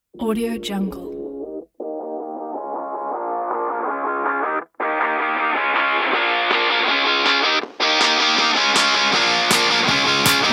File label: upbeat happy indie